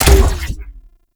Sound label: Grenade Shot; Grineer; Weapons